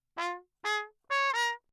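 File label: trumpet mute Bach phrase; Perinétventiltrompete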